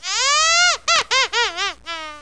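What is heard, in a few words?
Squirrel; Bible Fun Pack; Education Publishing Concepts; NOAH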